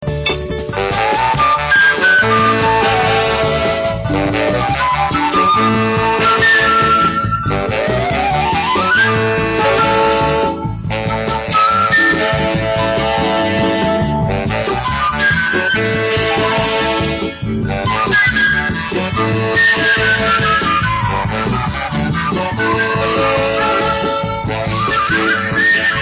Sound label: flute